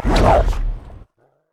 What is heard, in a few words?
wolfman hungering strike; LoL; warwick